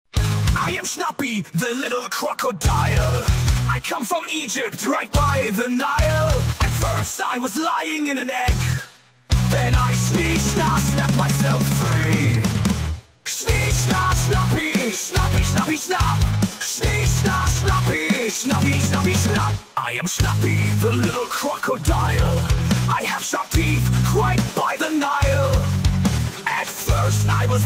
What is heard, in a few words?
metal version